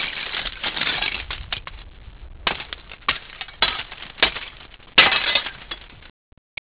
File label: glass